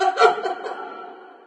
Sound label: town scared sob; ambient, creatures